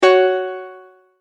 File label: multimedia tone exclamation; McKinney Sound